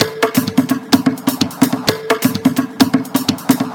Percussive Loops 128BPM; Vengeance Samples

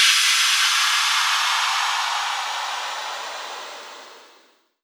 Cymbals Crash; Vengeance essential club sounds-3

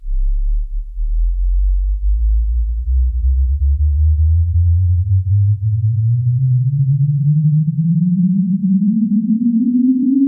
Sweeps Long

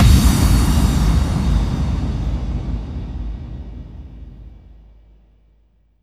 Bassdrums FX, FX Kicks; Vengeance Samples

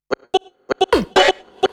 House Loops, Vocal Loops House 128BPM

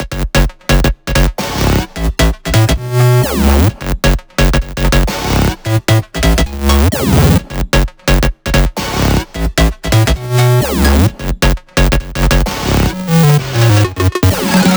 130BPM Melody; VFH1 130BPM Change Kit

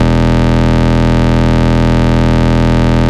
Root E, Bass

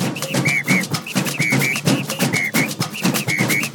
Latin House Loop 128 BPM; Vengeance Samples

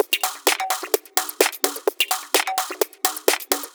Minimal Loops

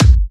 Bassdrum; Vengeance Samples

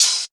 ohh, hihats; house; PS-28 Vocal House Samples; Drum & Perc

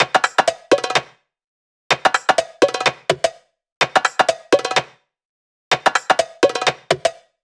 Kit 12, 126BPM; Purple Kit; Vengeance Samples

